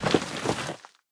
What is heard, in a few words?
Ice Winter Lord Stone Shield